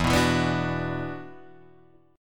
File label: E 7th Suspended 2nd, Guitar, Drop D; chord shape